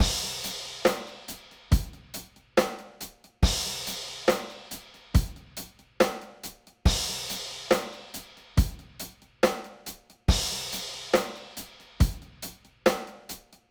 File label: rock